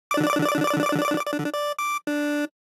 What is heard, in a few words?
DICE Electronic C, Roll; Samples; ArticulatedSounds; DICE Sfx Party (Extended)